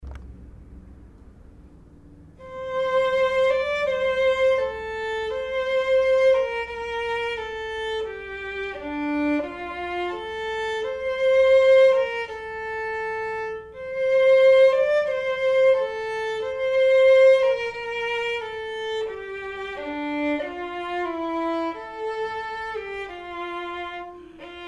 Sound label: perf vln